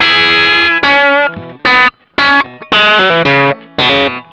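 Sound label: blue riff, Vintage Blues Guitar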